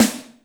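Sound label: Snare Crisp, Alesis D4 drum machine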